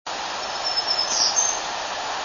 Townsend's Warbler; Townsend's Black-throated Wood Warbler

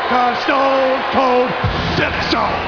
Stone

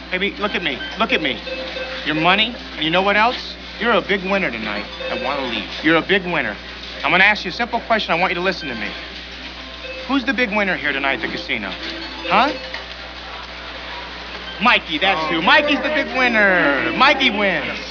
swing

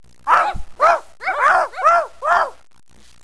bark

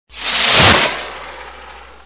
Firew

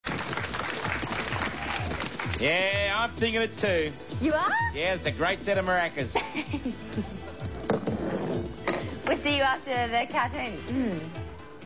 MARACA

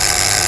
Buzz

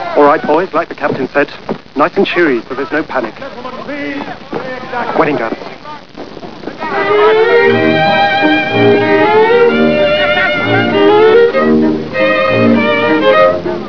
cheery; Bandleader Hartley; "Alright boys, like the Captain said, nice and cheery so there's no panic. Wedding Dance."

